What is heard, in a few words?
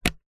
household alarm clock button plastic switch